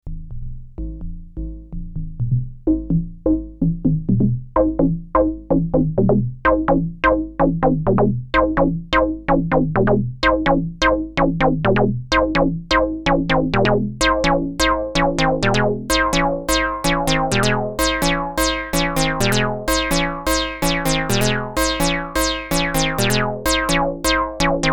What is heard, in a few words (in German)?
Behringer Model D Filterspiel